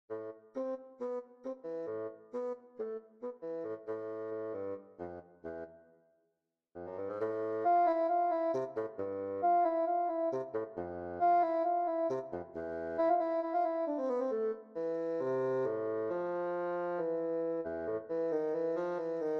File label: Tango; stagione